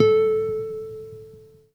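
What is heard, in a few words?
classical solo, guitar; Greytsounds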